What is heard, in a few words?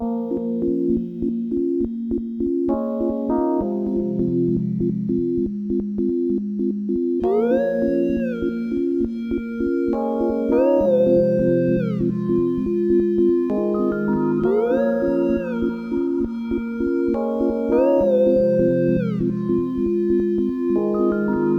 Intro + Bass+ Loop+ Melody